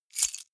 key ring large place hard

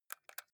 console controller xbox joystick press fast

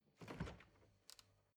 refrigerator door open

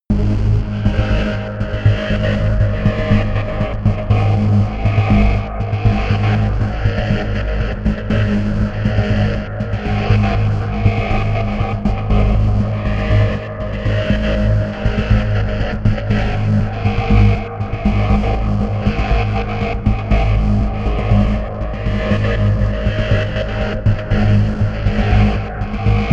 sequence unsettled bass

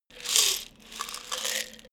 pills pouring

